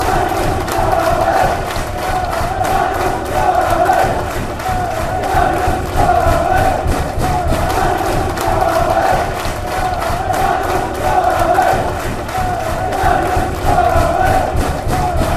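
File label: fanchants, football chants; danny willsons barmy army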